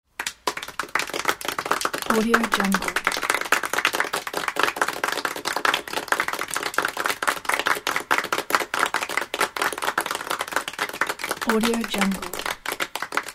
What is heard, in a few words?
applause